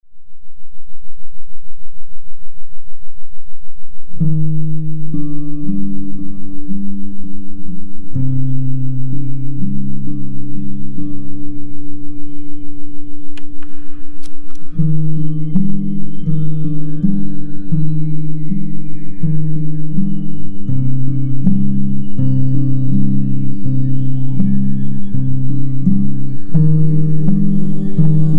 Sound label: Cassette Organ